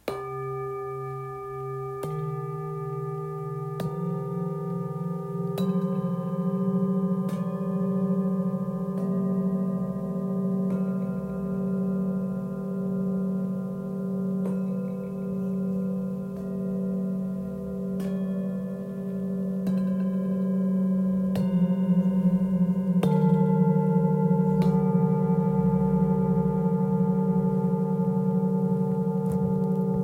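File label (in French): Set, bols chantants tibétains; horizon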